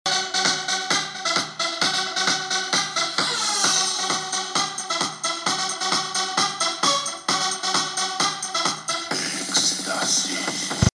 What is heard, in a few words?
techno